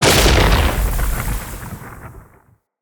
Ground Slam Impact; Enemy; Abilities; Nimrod

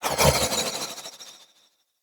Decoy Arrow Whiz By; Powers; Fey; Saboteur

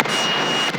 Static Out; Night Wave

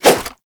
Nunchaku End Whoosh; Weapons; Warframe; Tenno

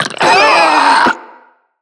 Infested Ancient Charge; Enemies; Puss Ancient

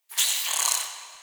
Interior Hiss; Ambience; Indoor; Warframe; Infested Eris

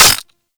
Mech, Magnum; Tenno; Magnus Prime

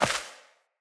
Sparrow Impact; Animals; Death State

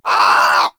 Bird Of Prey Vocal Scared

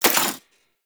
Mech Alt, Rifle; Thanotech; Trumna Prime

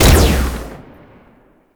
Spear Gun Shot; Hoplite

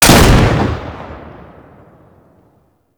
Grineer Hand Shotgun Shot; Weapons

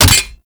Grapple Hit; Weapons

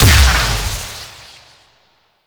Fire Medium; Duviri; Incarnon Adapters; Weapons

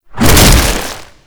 Proto Infested Ancient Small Arm Swing; Warframe; Abilities

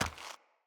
Walk Gravel, Footsteps; Enemy; Mockery; Mockeries

